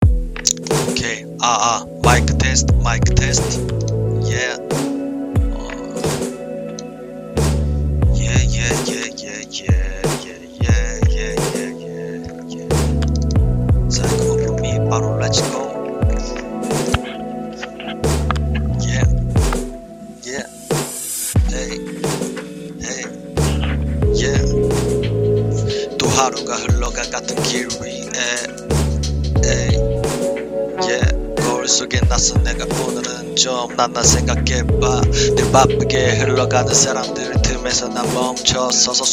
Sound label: Jazzy Hip-Hop